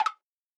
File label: Woodblock